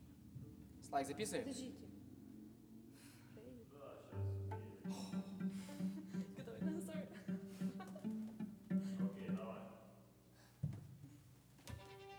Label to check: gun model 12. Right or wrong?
Wrong